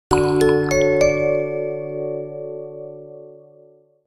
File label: crystal, notifications; ZTE Grand-S-2